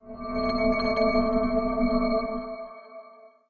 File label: Btl Actlrng Vortex; The Denpa Men; Android